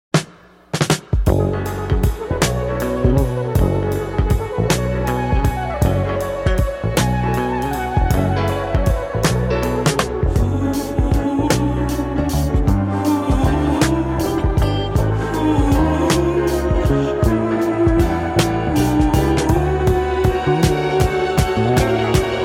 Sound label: French Waltz